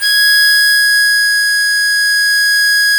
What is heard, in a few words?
HARMONICA